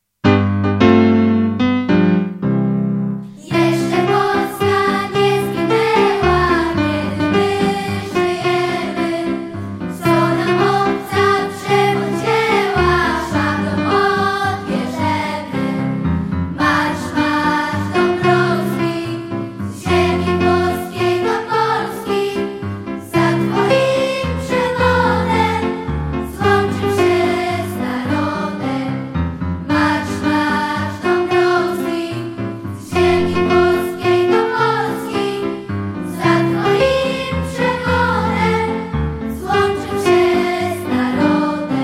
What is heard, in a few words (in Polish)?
hymn polski; swieto niepodleglosci